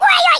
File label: oof, Russian